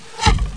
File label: arrow; Odyssey Demo